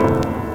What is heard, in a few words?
SP WARNING; SP1200; E-MU Producer Series Vol. 8 – Platinum Phatt; PlatinumPhattCD1